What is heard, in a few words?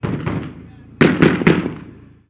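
gun assault medium; Call of Duty Modern Warfare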